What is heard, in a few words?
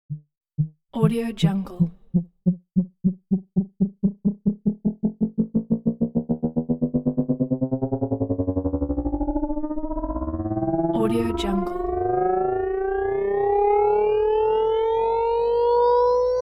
futuristic ufo warming up